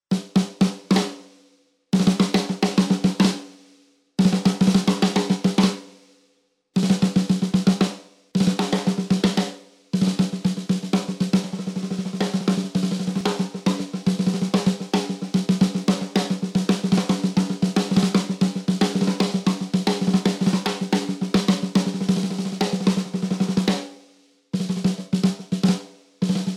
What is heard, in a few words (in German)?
Snaredrum alleine; Fame MS Drumkit Pro